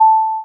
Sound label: ding